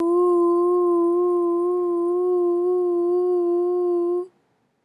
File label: only vibrato VOX